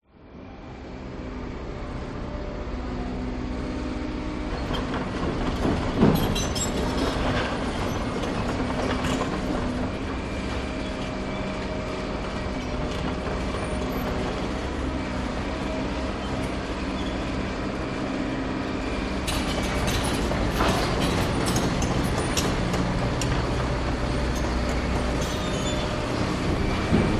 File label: Construction Ambience; audio hero